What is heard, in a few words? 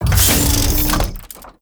Door Large Close; Ambience; Warframe; TheNewWar; Zariman